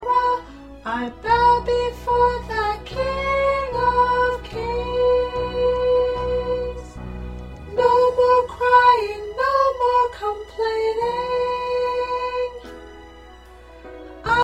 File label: Soprano